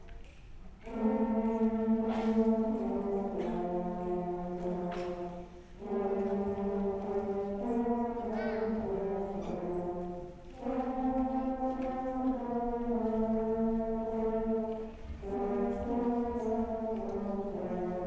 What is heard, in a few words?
Horn Feature, Beginner Winter Concert; Knight Band